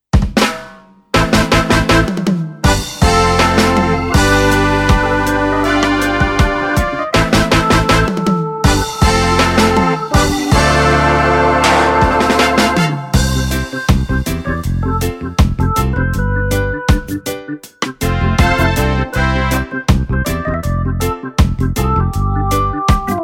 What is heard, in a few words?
roots reggae